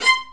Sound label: Spicato Basses & Celli